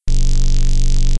Door Beam; Playable Tutorial Level

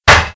Grill; Playable Tutorial Level

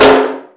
Tonk; Playable Tutorial Level; TR3

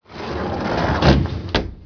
Elevator Close; Playable Tutorial Level; TR2

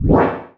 Fire Charge; Willard; TR3; Playable Tutorial Level

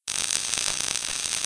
Weld Thru Door Loop; Playable Tutorial Level

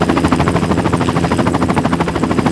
Helicopter Loop; Playable Tutorial Level

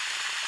Flare Burn Dry; Playable Tutorial Level

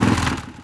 Vehicle Quadbike Accelerate; Playable Tutorial Level; TR3

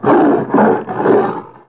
Leopard Growl; Playable Tutorial Level; TR2

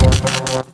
Fusebox; Cleaner; Playable Tutorial Level; TR3

